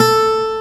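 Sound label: acoustic guitar A3; EL-GUT G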